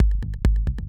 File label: Bass Tech